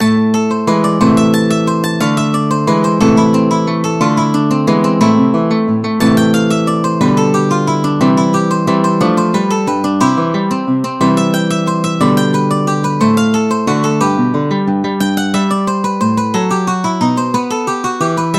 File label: Suite I for harpsichord in B minor Corrente